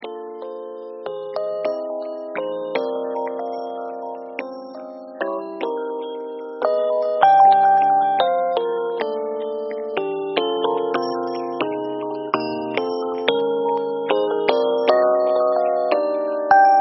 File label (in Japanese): エレピの音色に波形エディットをかけて、くすんだ感じにしました。; 夜空の美しいﾙｰﾌﾊﾞﾙｺﾆ